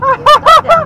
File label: high tone